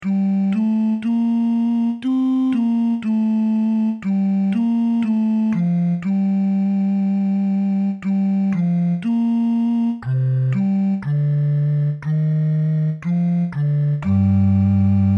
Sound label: Great Amen Bass; Creation